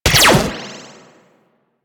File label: Spectra Fire 2D, weapons